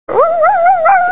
howl

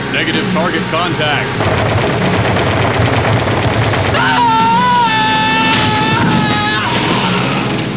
ahhh

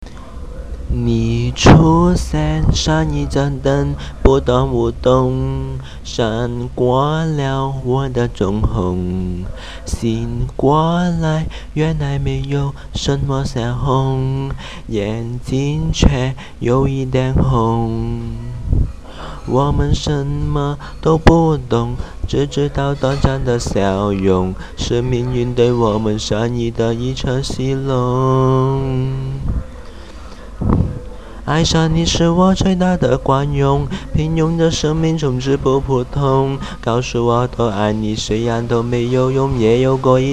dog

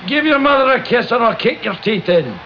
giv kiss; what happens when you don't give your mother a kiss

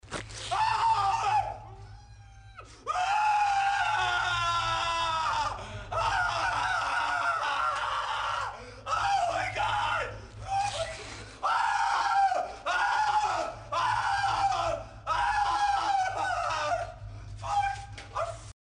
scream

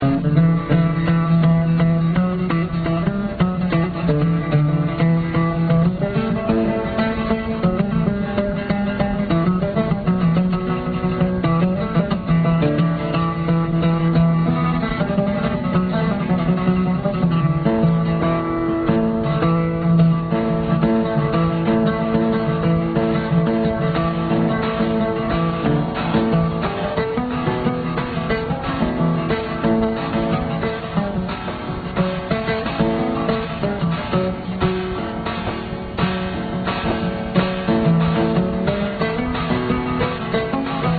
oud

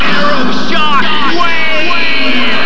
Arrow

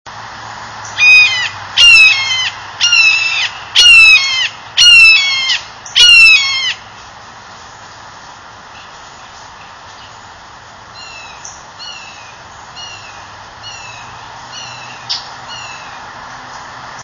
hawk red-shouldered

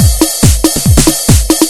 140 Dance

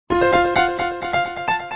piano

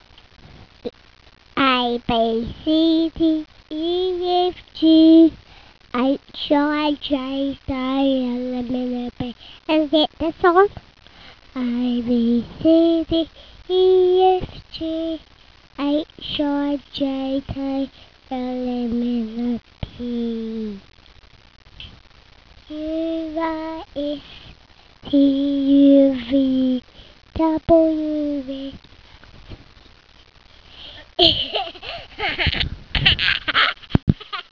singing the Alphabet Song; a b c d e f g h i j k l m n o p q r s t u v w x y and z / Now I know my a b c, next time won't you sing along with me?

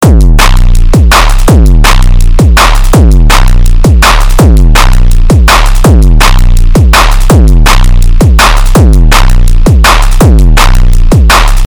Loop

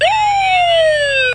siren

